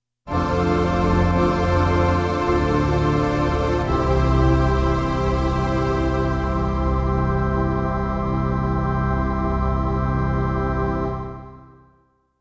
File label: organ finale